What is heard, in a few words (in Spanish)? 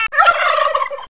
guajolote; animals; nokia